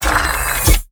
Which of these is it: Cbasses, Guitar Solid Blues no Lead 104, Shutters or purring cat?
Shutters